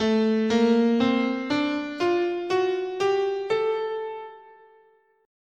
A Symmetrical, scale

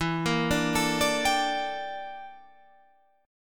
G Minor 6th Add 9th E

